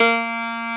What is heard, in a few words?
Piano D1